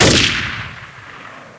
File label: hunt rifle shot; Falldemo